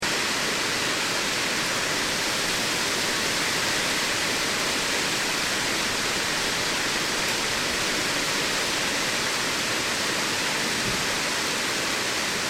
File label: Storm Heavy Rain; Storm - Heavy Rain And Thunders; Weather; Crafted Signals